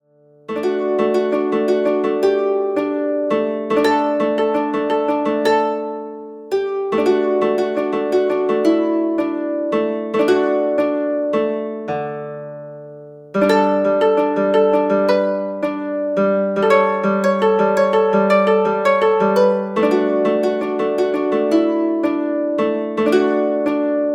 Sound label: Lute Song